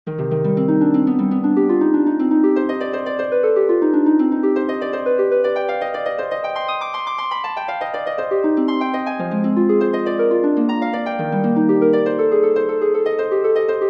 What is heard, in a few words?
solfeggietto c minor for harp